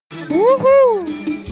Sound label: uuhhuull